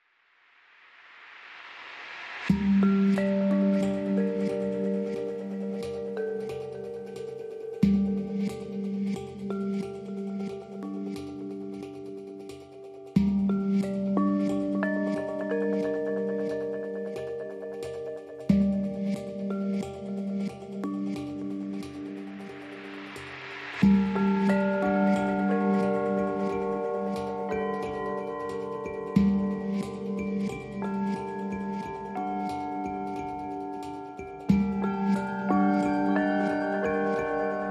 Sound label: Mallets and Bass Only